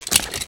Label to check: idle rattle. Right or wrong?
Right